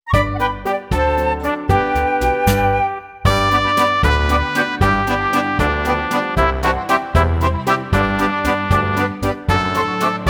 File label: Bounce and Jump